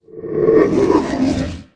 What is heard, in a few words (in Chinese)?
攻击, 鬼,幽灵; Enemy